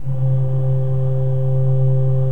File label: C2, Cathedral Organ, KOPPELFLUT; Propeller Island